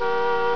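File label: CAR HORN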